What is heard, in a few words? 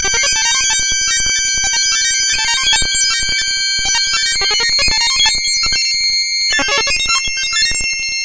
thinking